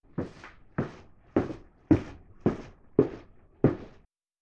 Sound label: steps